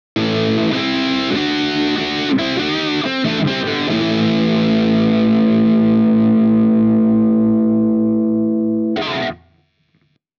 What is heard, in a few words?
guitar riff